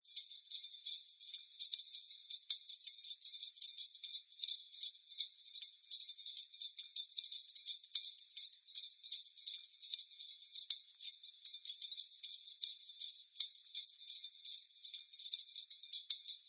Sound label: Jingle Bells, Small bells rattling evoking holiday spirit; Sound Effects:Seasonal